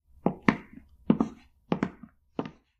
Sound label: Footsteps Tile Away